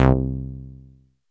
Cr-78 Bass Longer, Dance

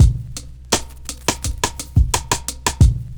BEAT, Hip Hop

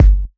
Kick, Kickdrum; Equipped Music; Loungin House

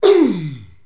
VOCDRUM, MOUTH PERC; Best Service; Hyper Dance

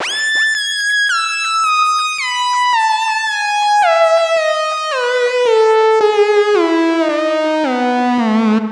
xtra lead

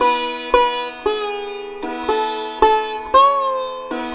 SITAR, Indian Traditions

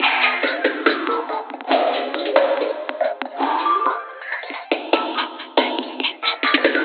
808 Loop; Sonic Foundry (Sony Creative Software); Esoterik Beatz

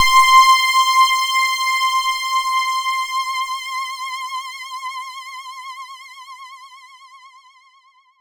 OBXA C6, Oberheim OB-Xa, Synths; Keys

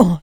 HIT, Jazz FX FEM PERC

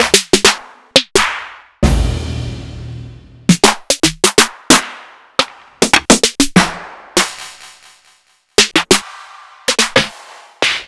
808 trap Snare Drums

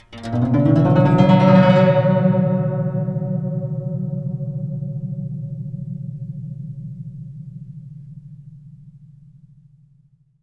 GUCH GLS, GUCHIN; INIS - Opium; GUCHIN-GLS

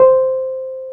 PNO, Wurly

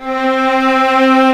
VIOLAS C3

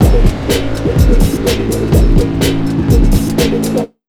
BEA, Big Beat Drumloops; USB Soundscan vol.25; AKAI; NYC 125

